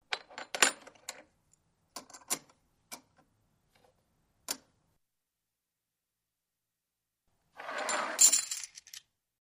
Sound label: Coin Movement Severa, coins